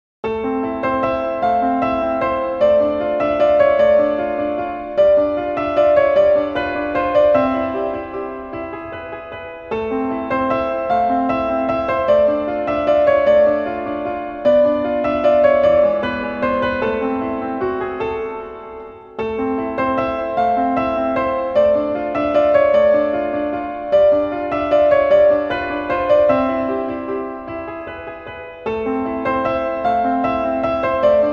Piano

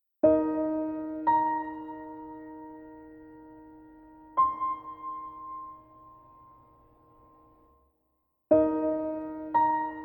Piano Version

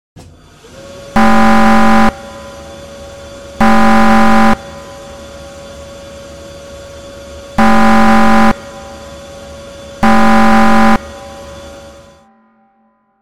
Warning; gekiban

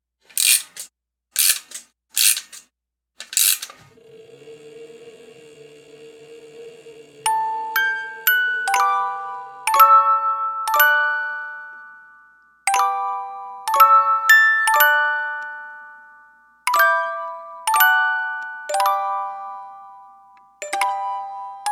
Orgel; gekiban

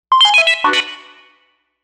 Error; gekiban

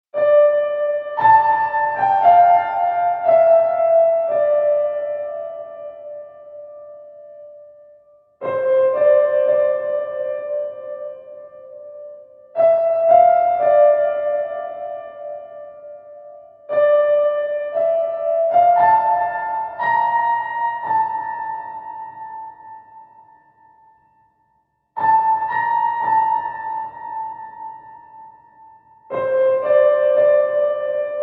Piano

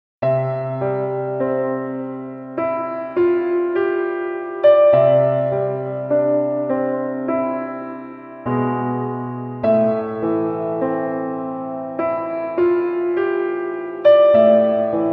Piano

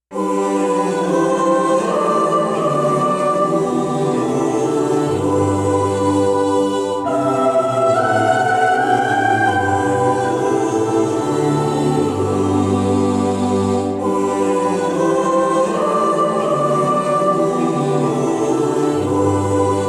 Choral; gekiban

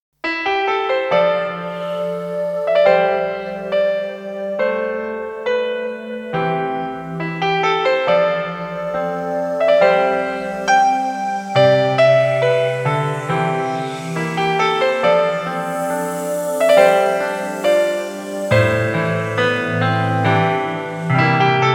Asian Rain

